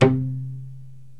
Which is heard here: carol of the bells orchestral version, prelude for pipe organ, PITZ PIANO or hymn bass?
PITZ PIANO